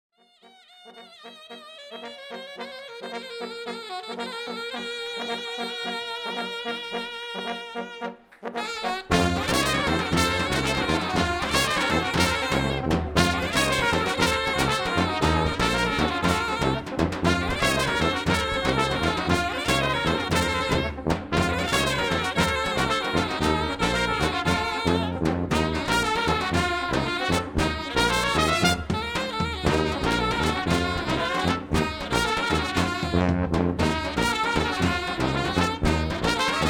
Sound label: Cocek, live; Underground